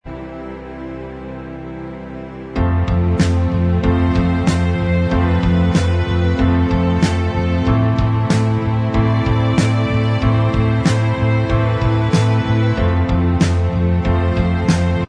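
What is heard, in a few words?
Inst Track, instrumental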